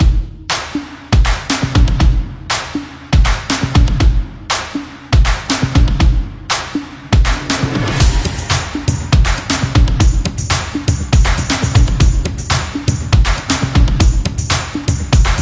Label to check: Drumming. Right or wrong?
Right